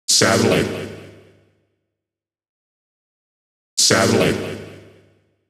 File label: Vocal Loop 130, Techno; Loops; Vocals; GhostHack; Ghosthack - Free Techno Sounds 2024